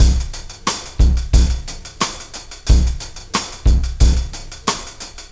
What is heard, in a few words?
R&B Drums